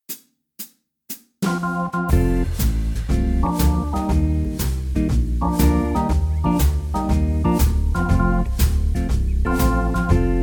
vollinstrumentiertes Playback CoolJazzClub F-Dur

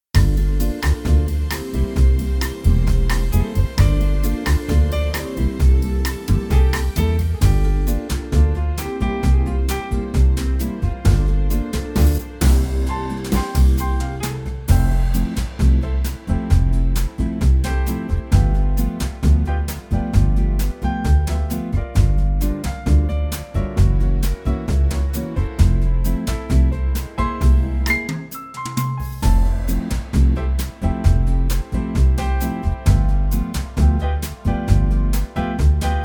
vollinstrumentiertes Playback bossa brazil F

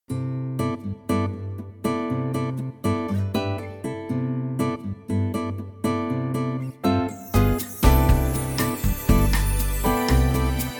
vollinstrumentiertes Playback Style BossaN; C-Dur

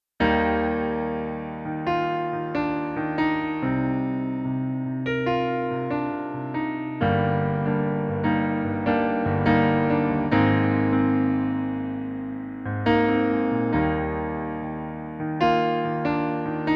Pianoplayback in Eb-Dur

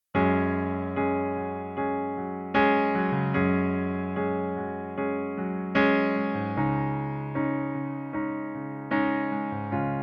Pianoplayback C#-Dur

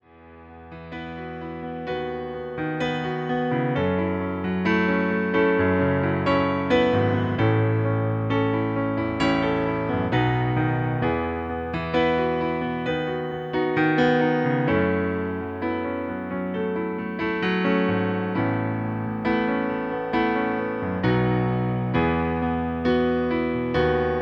Pianoplayback E-Dur

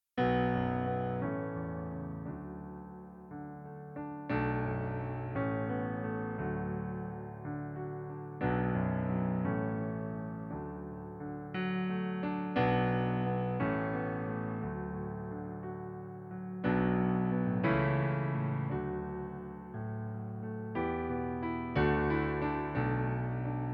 Playback D-Dur

